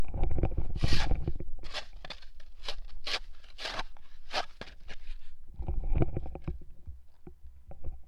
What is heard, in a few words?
Snow shovel